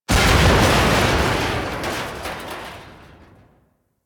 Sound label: Destruction Explosions Two; Ambience; Warframe; Corpus Railjack